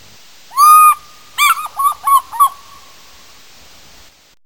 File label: fish eagle sound